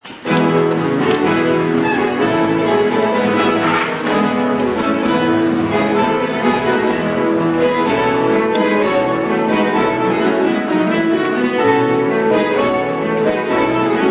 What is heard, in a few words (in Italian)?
orchestra chitarre; Bari; De Falla; Torroba